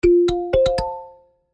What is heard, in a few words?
dialtone